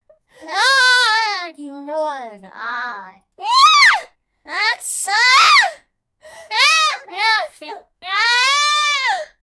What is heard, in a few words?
year old girl, vocalizations; 15